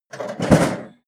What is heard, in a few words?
toolbox handling rough